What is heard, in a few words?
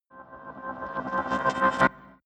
rise digital transmission